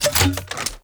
Flak Cannon Reload; Warframe; Weapons; UT Weapons

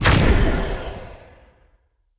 Staff Caster Cast Long Shot; Weapons; Player; Staves; Wazzard Staff Caster

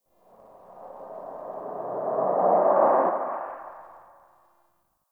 Backwards Whisper Yell; Warframe; Entrati

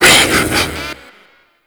Ape Prelate Attack Short, Vocals; Warframe; Proto Infested

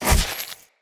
Corruption Block Cast; Characters; Enemy; Lady Deora; Abilities

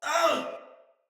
Surprised Soldier Male; Sinecure Soldier Male B

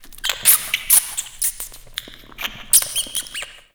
Ambience Subway Mice; Nineteen Ninety Nine

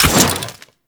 Quill Dart Gun Mech

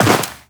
Walk Snow; Warframe; Characters; Tenno

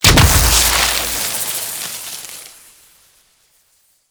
Plant Explosion; Warframe; Duviri; Gardening

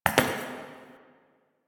Idle Single Step; Ambience; Warframe; Entrati; Tagfer

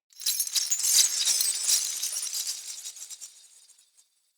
Sky Fortress Chain Move Trippy; Ode Sky Fortress; Tunnel

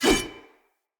Sword Whoosh; Weapons; Swords; Fenn Jotar Sword

